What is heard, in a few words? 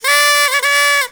FEST party horn, party People